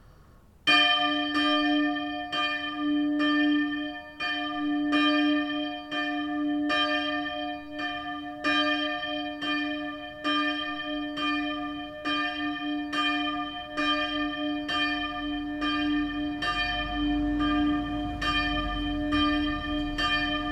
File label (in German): Glocken St. Mariae Geburt d